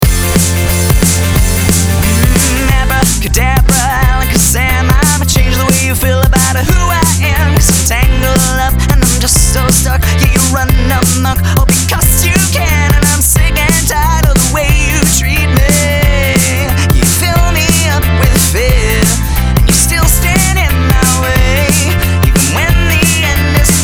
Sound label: Rival (Vocal Test